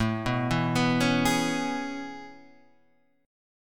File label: C sharp Minor 7th A; C#m7